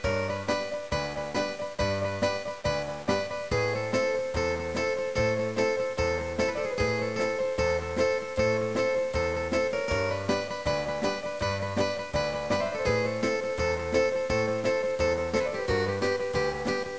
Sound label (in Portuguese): trilha; GEOGRAFIA